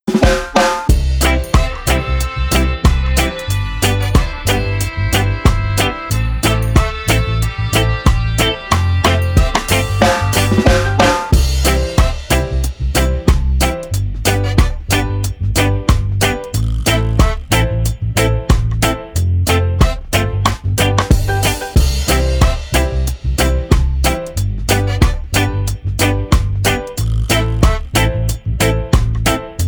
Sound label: Instr 92